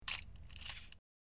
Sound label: Camera Clicks Wind; shutters & movement